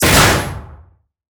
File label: Weapons Missile Launch In; DCS